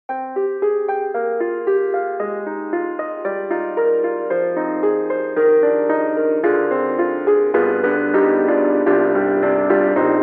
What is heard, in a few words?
imperial grand, lo-fi FX piano; IK Multimedia; SampleTank3